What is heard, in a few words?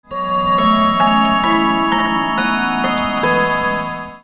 BELLS